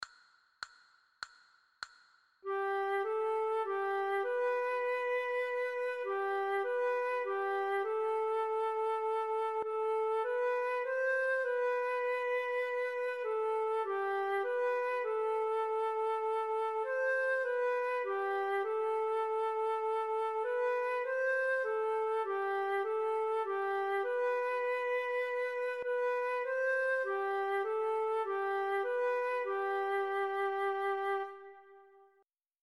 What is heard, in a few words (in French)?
tristesse, flute a bec